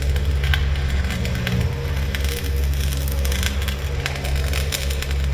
poltergeist idle; STALZONE; customnpcs; stalker

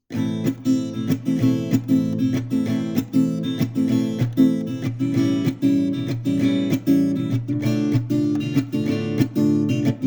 guitar; STALZONE; customnpcs; stalker

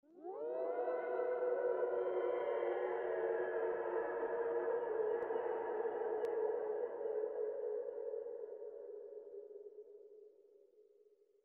moan; STALZONE; minecraft

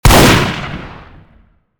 shorty fire; STALZONE

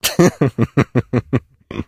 bard laughter; STALZONE

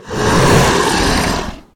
bloodsucker attack; STALKER; customnpcs

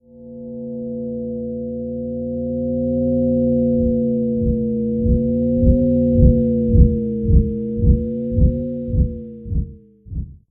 burer scan; STALKER; customnpcs

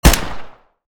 ak12 fire; STALZONE

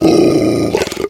dog die; STALZONE; customnpcs; stalker

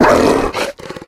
dog die; STALZONE

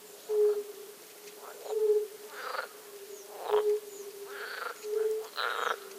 Small ambient frogs; STALZONE

